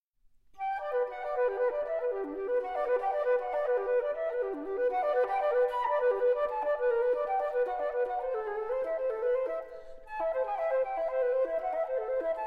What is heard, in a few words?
Flute Etudes, in G Major